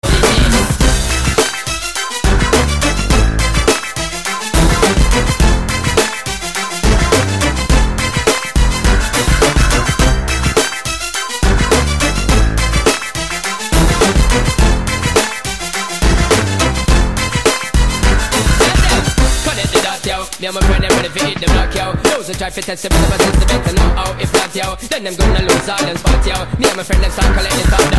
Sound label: Groove